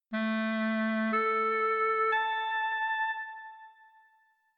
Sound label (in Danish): hz toner; Spil med hertzsøjlen - Første møde med F og G nøglen